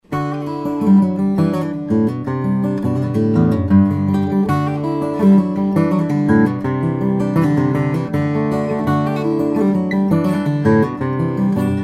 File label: om